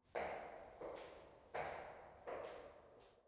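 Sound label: ambience generic sounds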